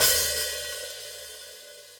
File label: Hat Edge Open; hihat; drum hits; Ableton; Factory Packs; Drumdrops Recording Hybrid Kit